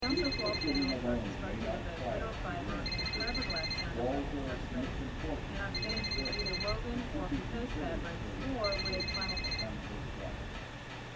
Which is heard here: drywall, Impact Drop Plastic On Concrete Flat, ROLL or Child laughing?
drywall